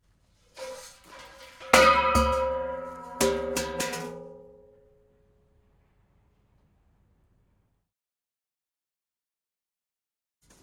Bandkanon 1C - Simulated Shell Ejection, Artillery Vehicle; Pole Position; VAR SFX; MS Decoded